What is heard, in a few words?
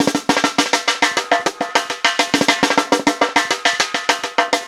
Snare Particle, Processed Drumkits